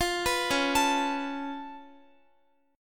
Db Augmented 7th Db down Guitar; Db+7; arpeggiated

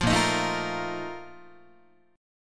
A sharp Major 7th Suspended 4th, Guitar; strummed

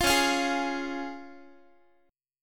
Db Major Db Guitar; strummed; tuning=4A,4E,5A,5D,5G#,6C#; 20,21,20,18,x,x

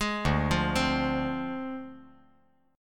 D Minor 7th Flat 5th

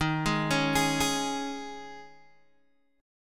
D sharp 7th Suspended 4th D sharp, Guitar Open G

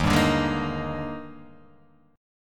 Eb Major 11th, chord; EbM11; strummed; JGuitar

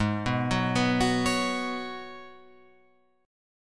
G sharp Minor 11th G sharp Guitar Open F, chord; G#m11 arpeggiated; 8,6,6,6,9,8

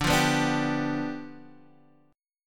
G 7th D

